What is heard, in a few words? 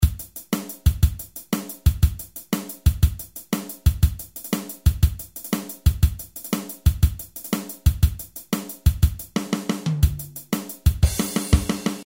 Blues